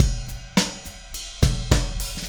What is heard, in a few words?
Beat Fill, Indie Rock; Sonic Foundry (Sony Creative Software); Indie Rock Anthems